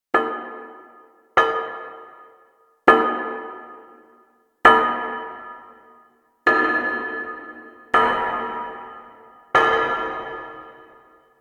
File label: Destroyed Class Void Kid Intro Window Tap; Cinematics; Warframe; The New War; Zariman